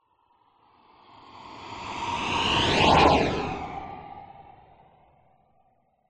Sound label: science fiction spacecraft pass by